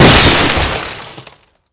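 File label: glass break automobile medium; Explosions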